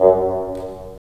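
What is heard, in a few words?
WND BN, wind bassoon; LA Composer vol. 3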